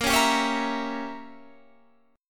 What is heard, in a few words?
Bb Diminished 7th Bb Guitar Standard; strummed; JGuitar